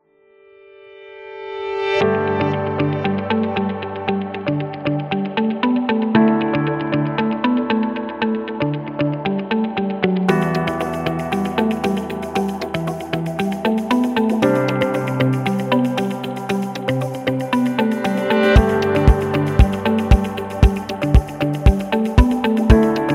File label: Business Background